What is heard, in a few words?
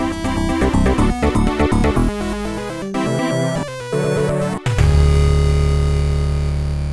jingles; Woodys World